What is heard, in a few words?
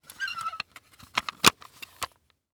Electronic Cassette Case Close